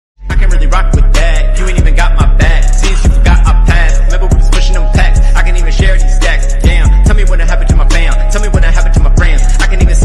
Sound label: rap